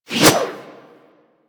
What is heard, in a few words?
Axe Small Swing; Warframe; Weapons